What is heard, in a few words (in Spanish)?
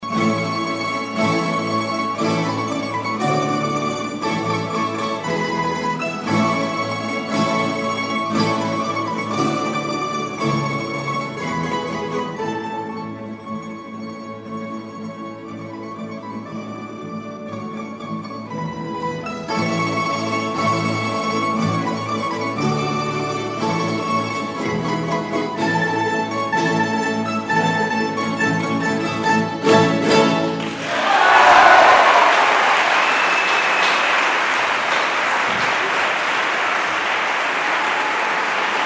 Marcha Turca